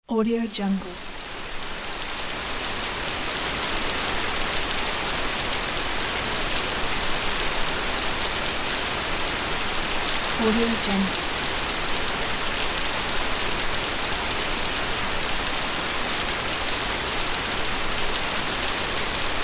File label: heavy rain shower